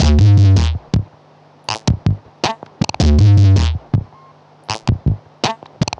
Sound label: Modular Loop, Modular Percussion